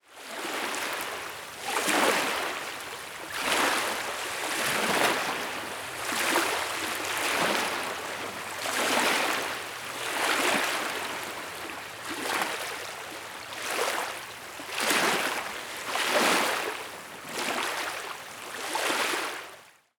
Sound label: lake waves lapping gently on the beach; nature